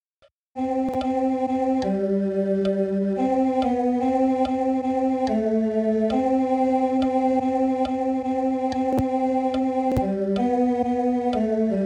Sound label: O Lord my God, TEN